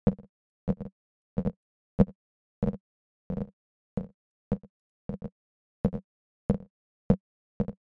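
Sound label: synth wood, walking sounds; The NIW Project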